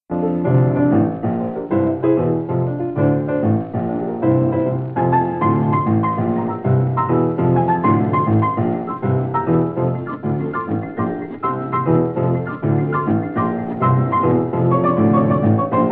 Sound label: Zulu Piano Medley